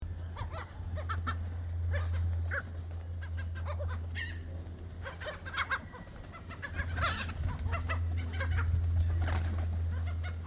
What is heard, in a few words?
laughing gull